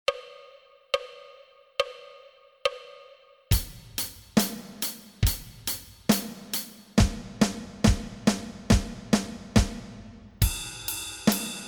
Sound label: Rocker 110